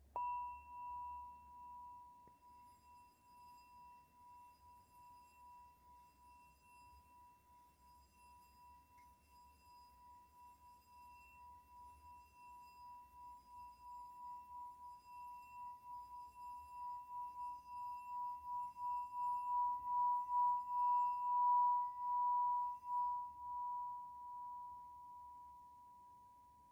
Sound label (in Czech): tibetska misa